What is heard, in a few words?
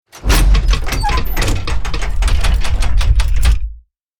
Big cinematic gears movements